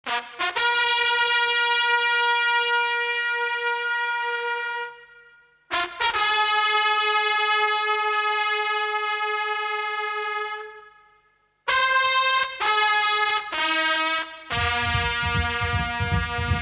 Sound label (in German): Fanfarenzug Fanfare; Rauenspurg e.V.